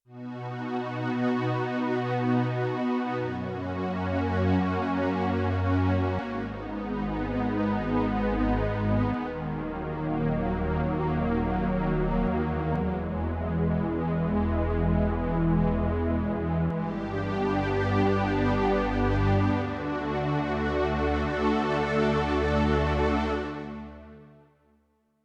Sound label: Fat Strings, Yamaha TX16W; Synth Set